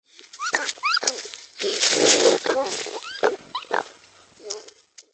Waschbaer Procyon lotor; Tierstimmen

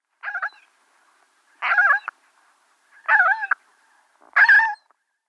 Skua Stercorarius skua, Vogelstimmen

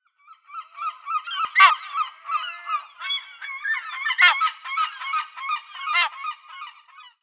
Silbermoewe Larus argentatus; Vogelstimmen

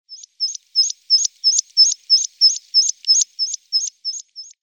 Strandpieper Anthus petrosus, Vogelstimmen

